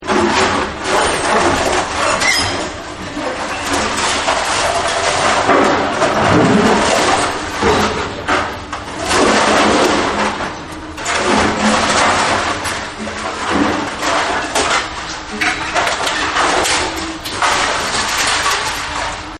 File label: Metal Destruction; Metal Sound Effects; Materials